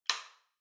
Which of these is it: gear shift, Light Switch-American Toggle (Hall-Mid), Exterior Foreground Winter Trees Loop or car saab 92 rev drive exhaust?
Light Switch-American Toggle (Hall-Mid)